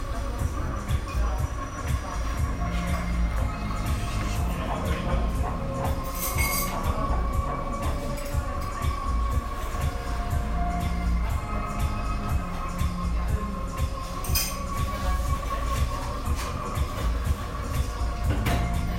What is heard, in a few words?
St Pauli, Hamburg